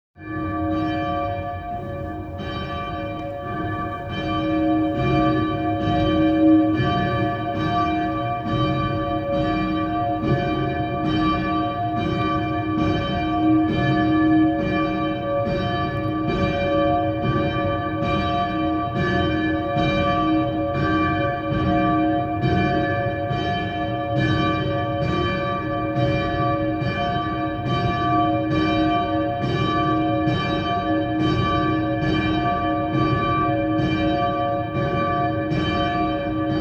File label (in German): Glocken Bursfelde; kloster bursfelde; BETEN